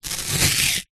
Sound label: band aid plaster strip peel from roll